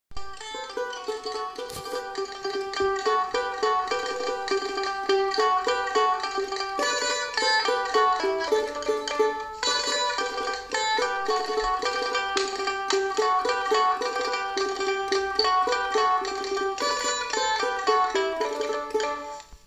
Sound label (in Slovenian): tamburica duet